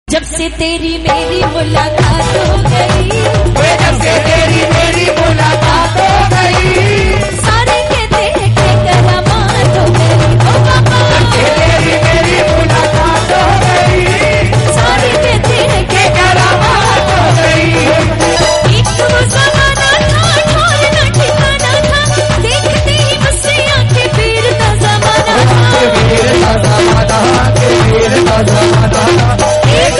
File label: Bhajan; Khatu Shyam